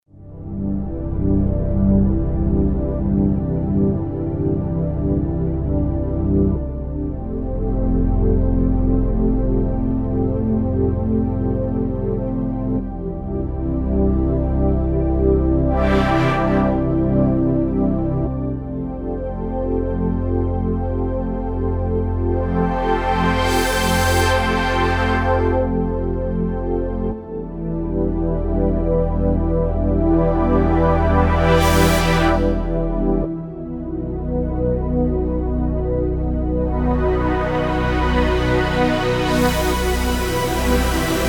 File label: Analog Pad, Roland JV-880; Factory Patches